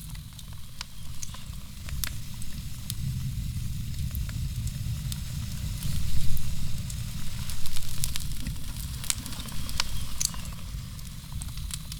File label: Fire; Zomboid 41.78.16; Project Zomboid